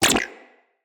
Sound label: Shield Impact Default; Warframes; Volt